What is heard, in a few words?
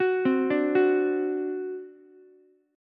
C Suspended 2nd Flat 5th C down Ukulele; Csus2b5 arpeggiated